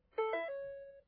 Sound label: alert; Shinobi